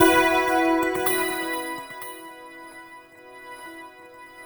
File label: GLIMMERS, Synthy Tonal; Distored Reality